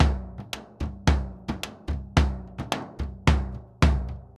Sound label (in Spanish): Bombo Murga 110, Drums & Percussion, Latina; Best Service; Festa Latina VOL-1